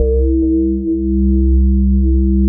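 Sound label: mellow square; Pro-Rec - Synthsonic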